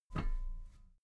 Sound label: metal ladder step soft muted